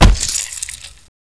claw strike

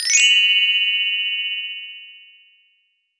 save load; garrysmod

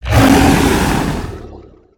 attack, creature; stalker

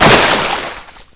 out water

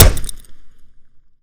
wrench hit; weapons; melee

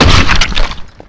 heart explode; deathlegends

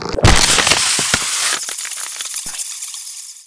antlion burst; npc

